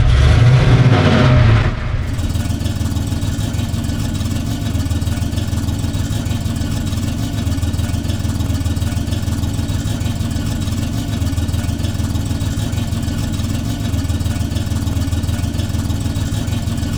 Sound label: rev short loop, vehicles limousine; sligwolf